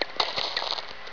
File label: vlf